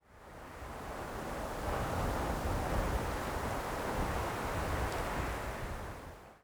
wind gust; Counter Strike Model Pack; CS:GO

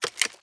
breach charge pickup; Counter Strike Model Pack; CS:GO; survival

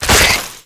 detach, headcrab; creatures; headcrab classic

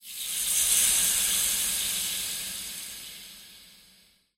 ambient maraca; evo maraca; wood

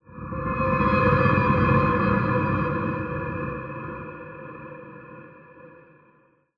amb prepper rhythmic, ambient

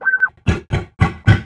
hunter idle, ministrider; npc; Half Life 2; Episode 2

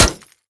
manhack, bullet impacts; Half Life Model Pack

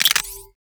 tablet upgrade start; Counter Strike Model Pack; CS:GO; survival

